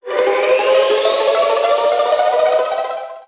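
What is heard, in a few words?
Reel Anticipation; ClubFusion; FruitChutes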